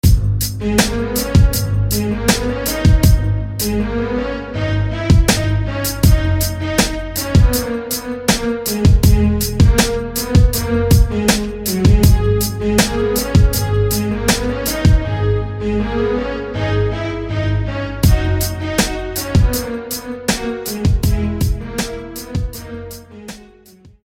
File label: The Warm Hit